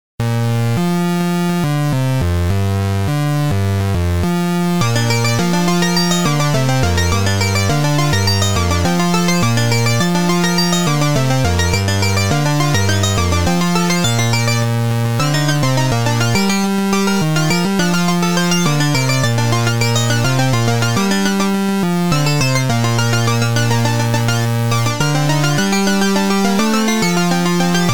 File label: harpsichord chiptune remix; Everfree Radio